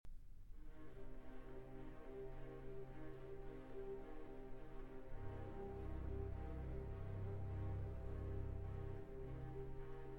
Concerto For Violin and Orchestra, For Saxophone, Crotchet = 108